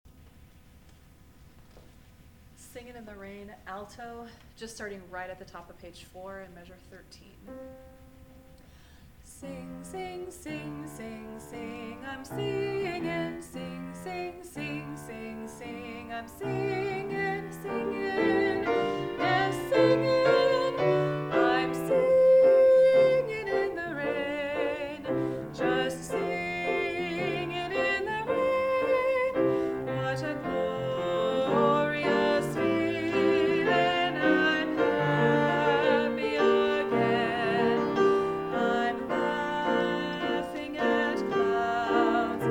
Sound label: alto